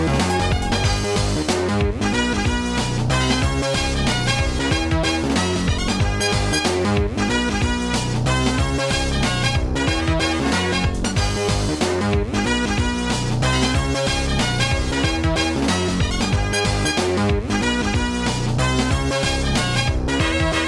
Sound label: Jazz Menu Music